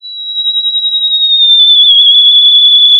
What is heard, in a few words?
pop, sound of a tea kettle whistling